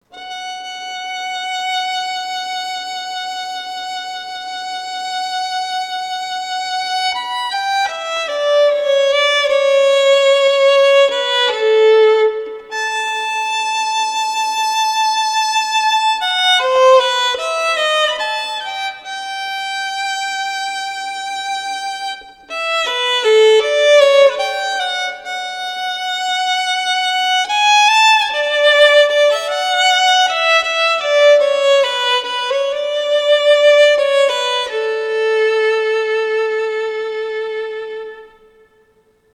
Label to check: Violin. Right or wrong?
Right